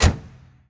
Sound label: Ferrari Testarosa Door Close; Automobiles